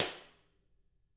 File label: Drums, SNRR; CAMCO